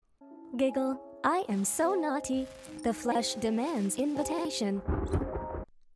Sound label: giggle i am so naughty